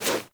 Wall Jump Foley; Warframe; Tenno; Wall Run

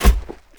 Step Default, Footsteps; Characters; Enemy; Mendicant; MndKing

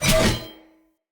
Cleaver Heavy Whoosh R to L, Swords; Weapons; Player; Bromius Cleaver

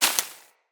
Bullet Impact Debris Grass; Weapons

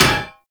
Melee Hammer Impact Generic; Hammers

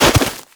Bird Of Prey Bodyfall Snow; Hunting Critters

